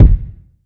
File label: Drum Hit Beat Long